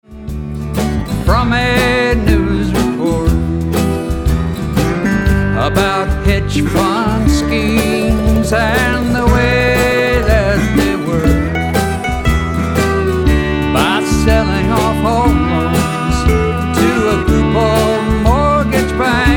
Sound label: Foreclosure Blues